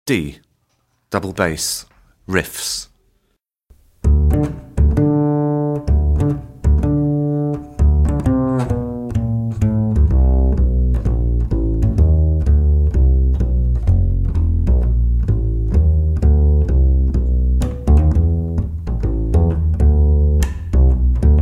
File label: D Double Bass Riffs; tap grade 2